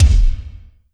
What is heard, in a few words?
Yamaha RM50 Reverb Kick